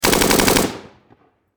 Warfare Machine Gun 7.62 Caliber Bren Lmg Long Burst Close Perspective; Machine Guns